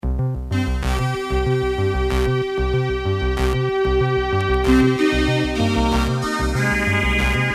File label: ringtone